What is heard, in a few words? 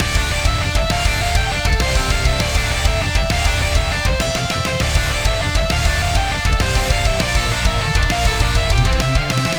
boss battle metal opening